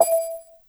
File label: Ding; Civ5